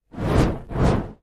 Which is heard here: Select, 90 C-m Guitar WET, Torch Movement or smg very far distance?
Torch Movement